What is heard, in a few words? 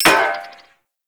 Flame Spear Mech; Warframe; Grineer